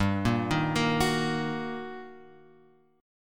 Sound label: G Minor Major 7th Sharp 5th, G; GmM7#5 Chord {3 1 1 3 X 2} arpeggiated